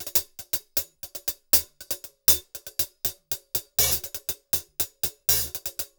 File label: 080, Top Ends, Beats; Zero-G; Monster Beats